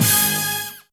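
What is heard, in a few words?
Brass Hit, Effect; drum machine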